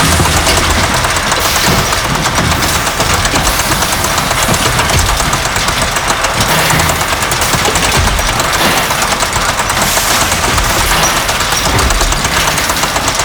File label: Galleon Platform Loop; Warframe; Grineer Galleon; Vor Galleon Fight